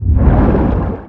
creature shadow leviathan seatruck attack loop water